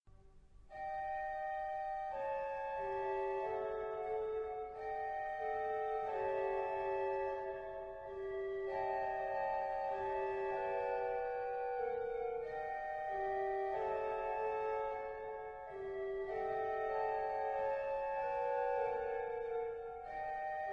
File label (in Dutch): Psalm 43 Orgelbewerking